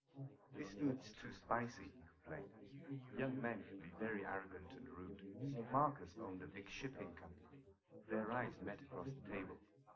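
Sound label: male, live recording 250ms 5cm; SiSEC11; underdetermined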